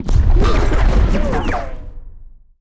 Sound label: auto cannon hit large; weapons; Stellaris